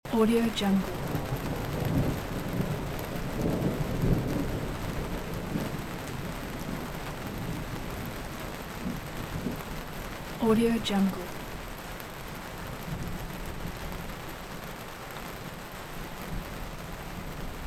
thunderstorm in greenhouse

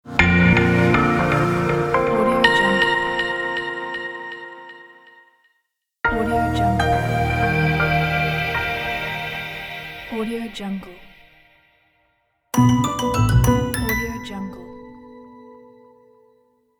branding logo pack

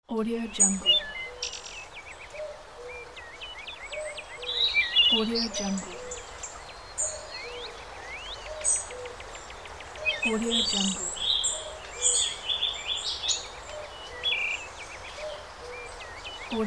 nature

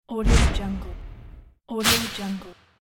flash; wavbrulee

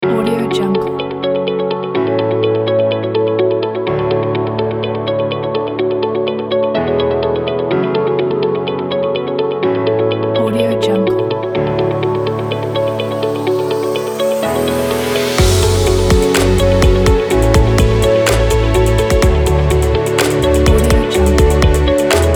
inspiring abstract timelapse; cleanstudio

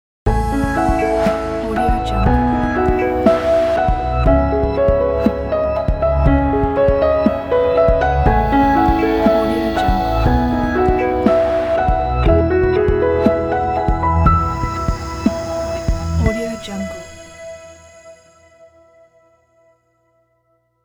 piano logo

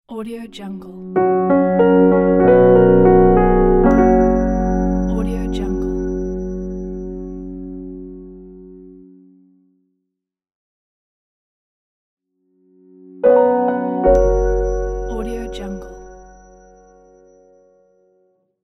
friendly piano logo

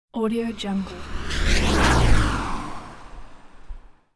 fast car driveby through puddle

